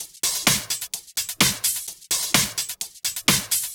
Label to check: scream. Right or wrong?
Wrong